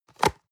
box small open hard

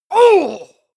male combat hit hard

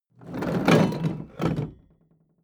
metal box roll smooth; maschinen; soundpool

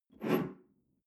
espresso grab tablecloth slide

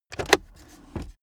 car accessories center console drawer open fast; autos; verkehr transport

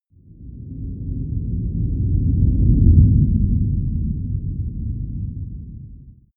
whoosh low slow

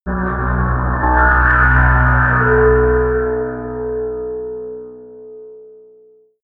scrapes tensing scariness, drones

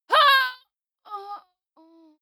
female die; schreie; menschen

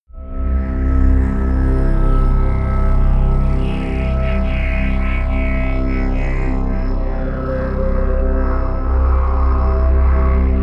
drone ethnic

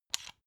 pepper mill assemble; berufe; gastronomie; soundpool

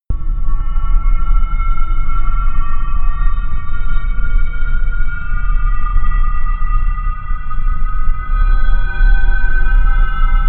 drone scratchy siren

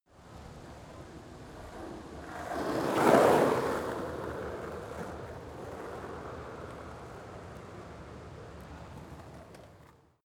skateboard single drive by; sport freizeit

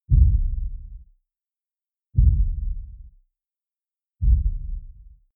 sub impact short distant

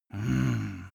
male sigh; menschen; koerpergeraeusche

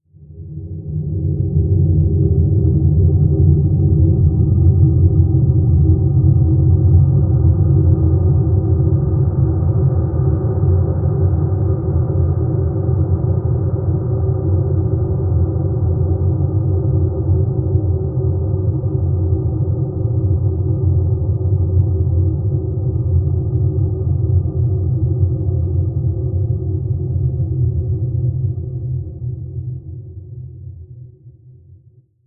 drone noise low

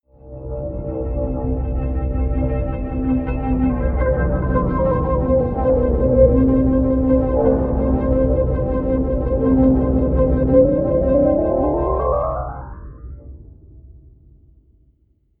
promo pad; drones

